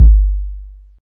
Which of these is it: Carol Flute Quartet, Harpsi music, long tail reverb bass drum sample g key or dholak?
long tail reverb bass drum sample g key